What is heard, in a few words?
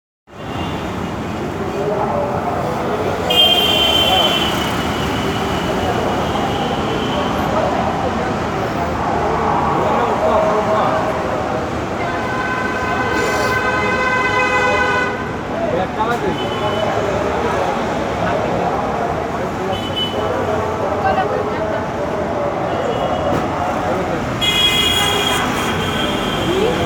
Busy City Sound